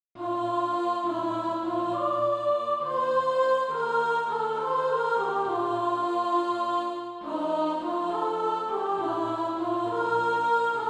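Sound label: soprano, Practise Music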